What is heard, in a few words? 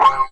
bell guitar